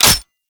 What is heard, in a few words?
Exalted Sniper Mech; Warframe; Frumentarius